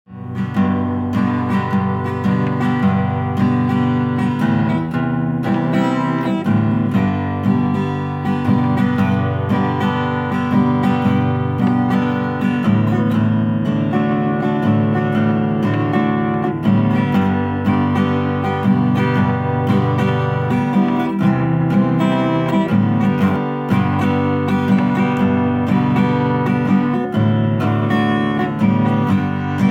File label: Takemine solo